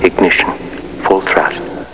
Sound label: Ignition, full throttle; Hal 9000